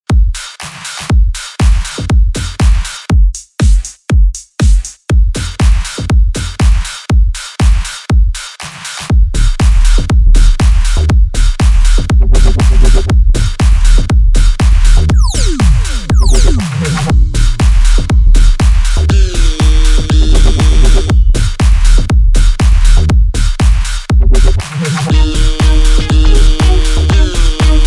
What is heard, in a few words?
Loop Pads